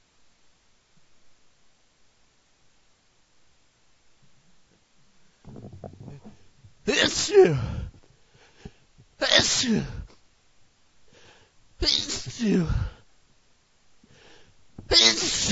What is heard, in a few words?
hurricane